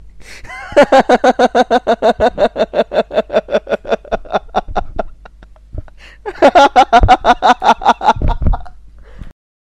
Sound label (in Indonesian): ketawa gak ketulungan